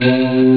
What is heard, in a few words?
Future Pad; ST-XX Amiga Soundtracker Sample Packs